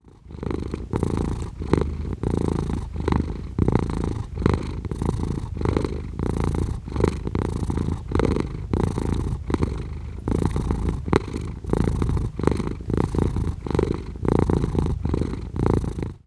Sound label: Cat Purring; افکت صدا حیوانات; Animals